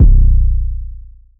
808 B; Cymatics; Oracle Sample Pack; 808s

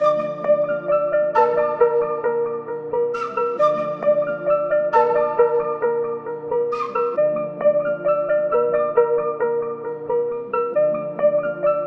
134 BPM D Min, Melody, Trap; Cymatics; 2022 Melody Collection; Trap Pt 2

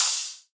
Future Bass Ride, Cymbals; Drums; One Shots

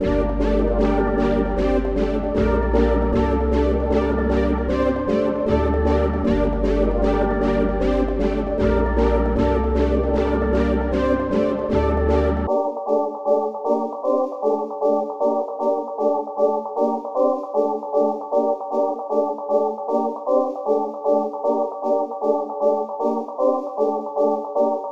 154 BPM G Min, Melody, Trap; Cymatics; 2022 Melody Collection; Trap Pt 1

